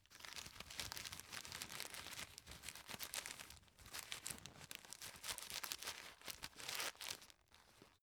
tissue stuff in package

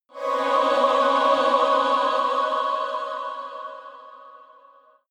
evil choir chord medium

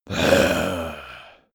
zombie moan idle heavy

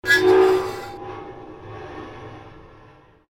metallic scream

